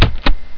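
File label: button, switches; movers; q3rally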